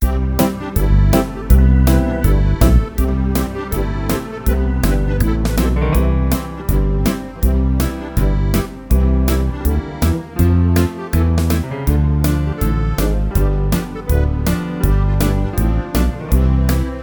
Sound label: JINGLE BELLS MODERATE 81